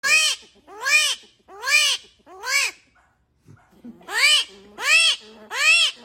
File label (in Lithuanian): Rutulinis sarvuotis